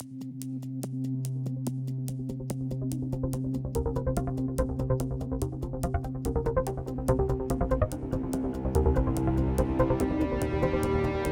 Music Loading 72bpm; Forza Motorsport 6 Apex

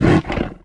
Monster Effort Small; Monster Ralph Effort Small

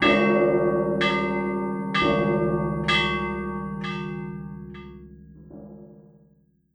A L A R M

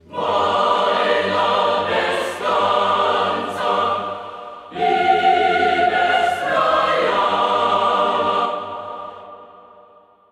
Impression Choir; Freedom Fighters; Impression Choir2

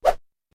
Swoosh; Dino Donkey Dash; Swoosh Sign

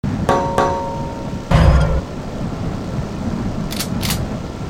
Knock; The Henry Stickmin Collection

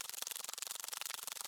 Spider Run Loop; gamerip; Spitter

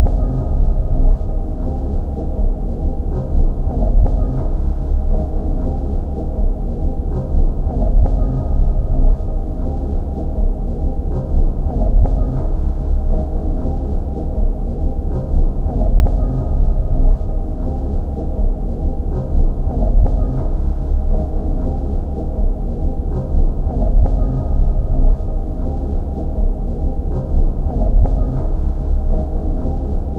Music Loop; Infinite Hole; Music Loop 9

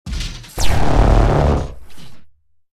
Core Burst

